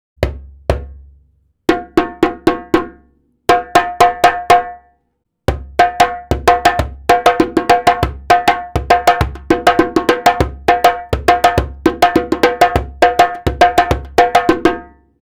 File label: djembe